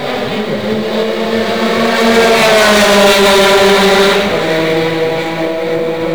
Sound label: 78 porsche; #79 Del Bello Noel Porsche front straightaway drive-by.